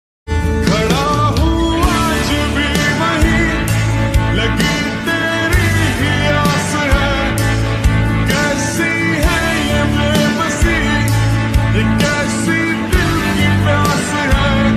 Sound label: indian pop lofi